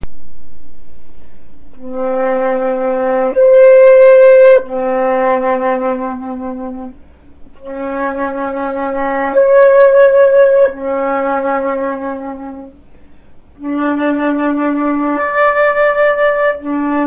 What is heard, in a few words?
Octave exercise, flute